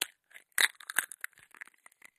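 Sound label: Cracking Then Opening, egg crack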